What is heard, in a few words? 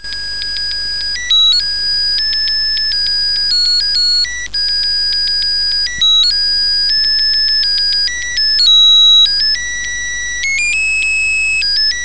jingle bell, melody